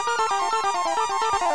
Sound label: breath